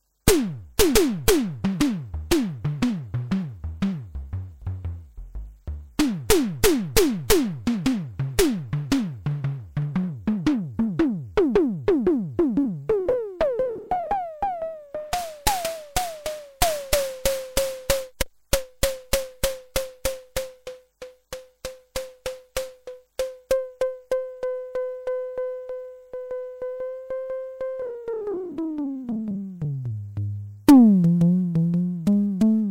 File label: Simmons SDSV tom